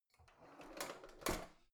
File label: old drawer creaky out